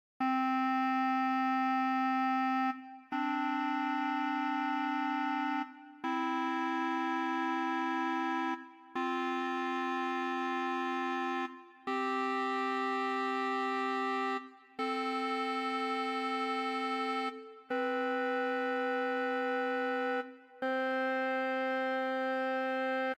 Interval ET

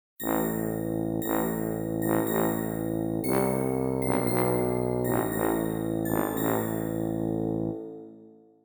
Narrative Music Pop Quiz

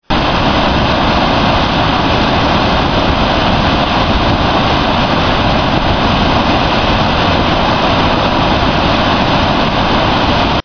Gullfoss

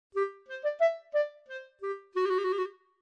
surprise

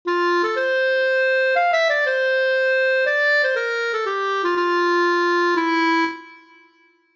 Sonatina in F; Pericic

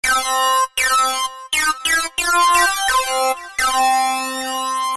sleepy baby bear theme

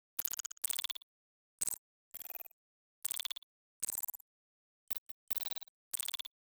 dark ui glitch light; The Sound Keeper; Dark Sci-Fi UI Sounds

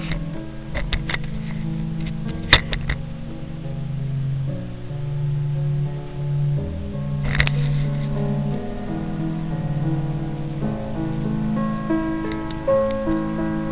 Piano Piece; Gods and Generals

